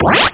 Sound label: bubble